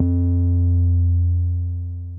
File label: Drum BPM110; Martian Chess